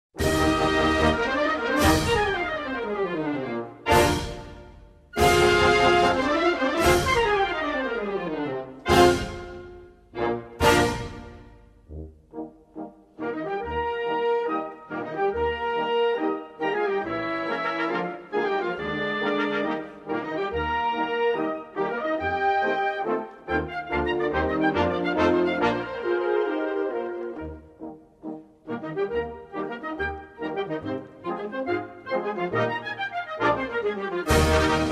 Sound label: anthem